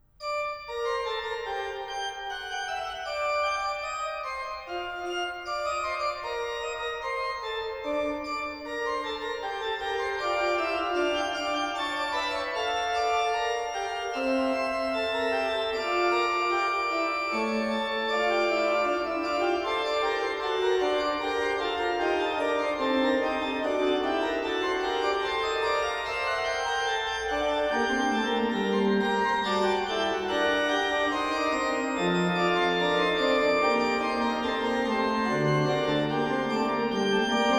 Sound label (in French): Fugue en sol